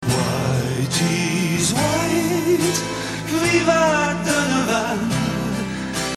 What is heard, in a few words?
Motif